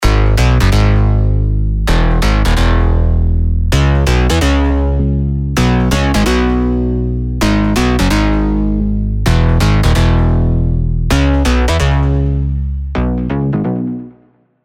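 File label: bass, Space Jam, Spire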